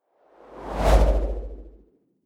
whoosh impact soft wobble bass

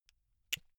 buckle plastic open

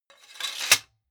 sword sabre sheathe

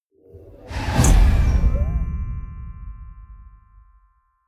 whoosh playful bell

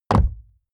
horse step wood

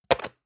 plastic can small lid close hard

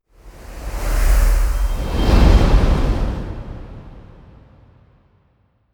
whoosh air

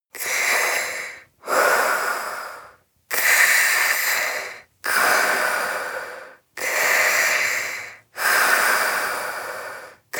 breath female sleep hiss sequence

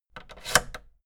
fader plastic scrape double up fast

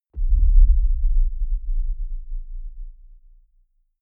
deep sub rumble

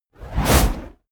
whoosh fire noise blast small

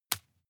juggle catch soft

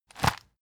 plastic bag hit hard

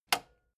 metal button spring push

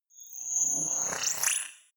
movement creepy robot

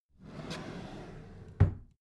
empty drawer smooth close soft

